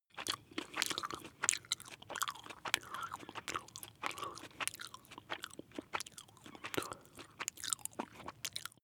chew jellybaby smack male

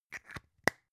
plastic spray can small close slow

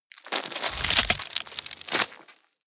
tear bone and flesh

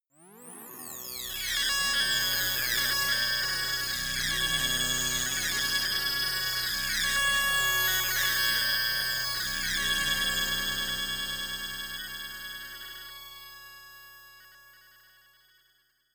glitch scanner

